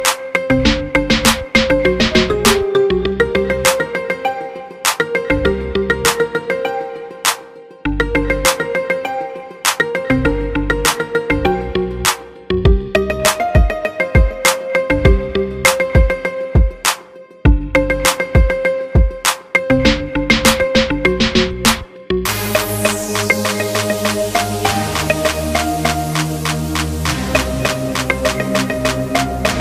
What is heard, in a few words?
marimba, ringetone